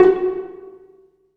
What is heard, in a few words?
PIZZ, Dance Mega Synth